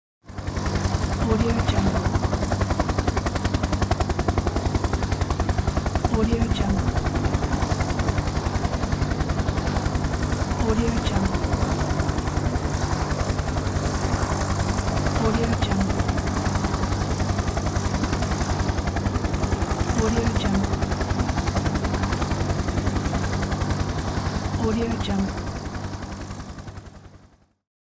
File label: helicopter